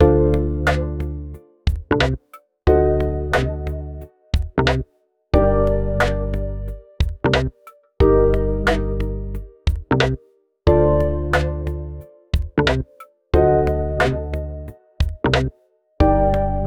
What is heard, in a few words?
seamless loop 90, lofi